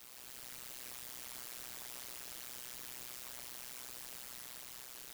noise

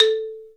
marimba

